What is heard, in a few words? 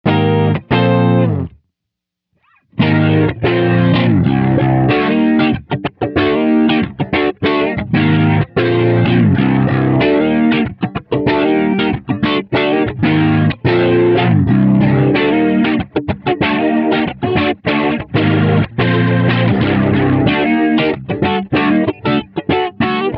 rotary; Mobius